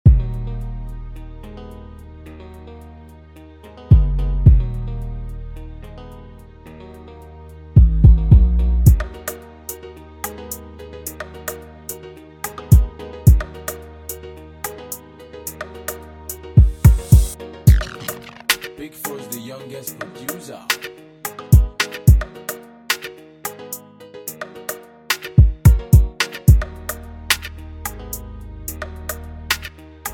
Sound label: Riddim Instrumental